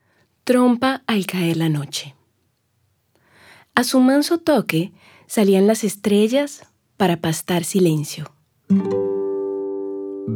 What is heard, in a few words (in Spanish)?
Trompa al caer la noche, Berrante a boca da noite; para viver o pão nosso